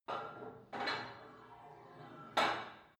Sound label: put weight onto barbell reverby; sport freizeit; fitnessstudio